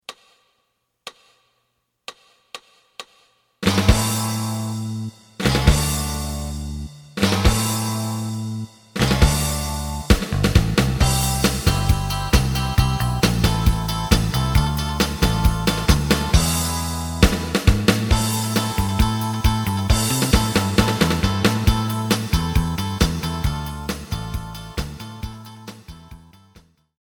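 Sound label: lead guitar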